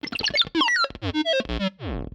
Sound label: robot disable